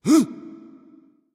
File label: grunt; smite; he bo